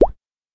Tap; songpop party

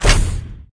Vehicles Hoverboard

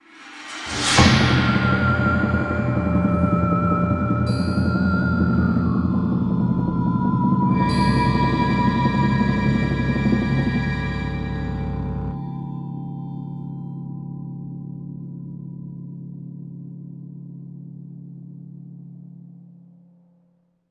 Sitar

